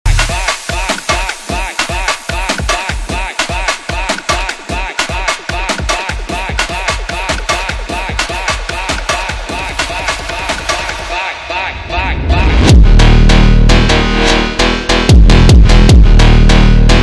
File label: brazilian phonk